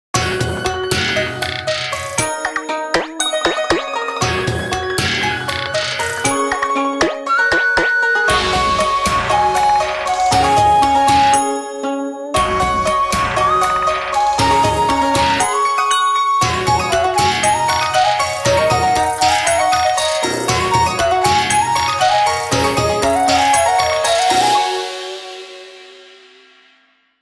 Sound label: Nokia Tones; Windows Phone apps